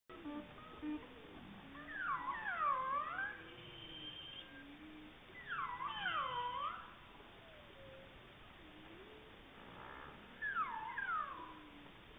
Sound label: Beluga; arctic; mammals